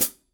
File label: hi-hat chick